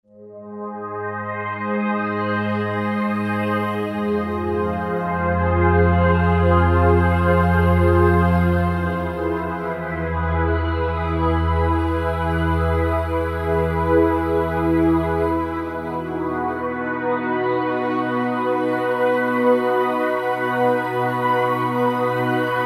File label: Roland V-Synth Filmy Synth; Factory Patches